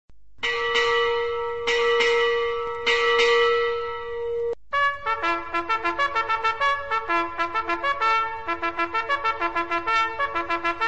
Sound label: dutch overal marine ringtones